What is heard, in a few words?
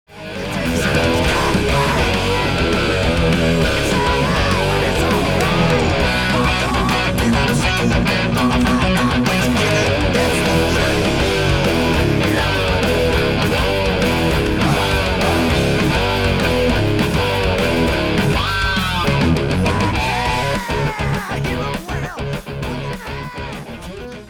Boosted Lead Guitar